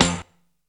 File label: HELLO, SNARES; Zero-G; Funky Elements